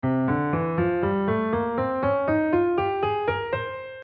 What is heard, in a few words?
two-octave scale - C mixolydian + C phrygian